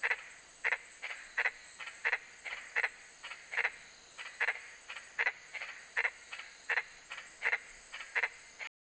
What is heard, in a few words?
litoria infrafrenata, frogs